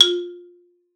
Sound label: F3, XYLOPHONE; Xsample VOL-4